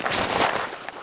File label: weapon inspect movement; tf2